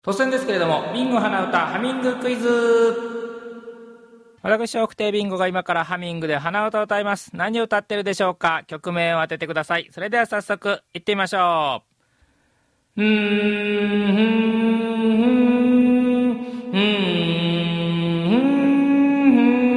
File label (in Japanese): hanauta